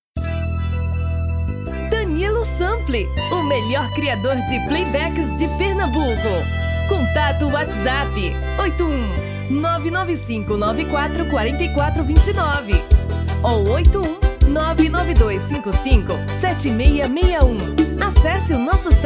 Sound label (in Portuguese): Vers Forro